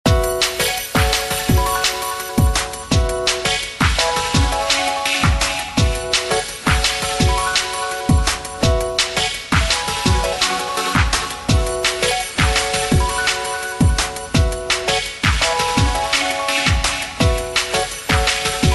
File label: nokia lumia ringtone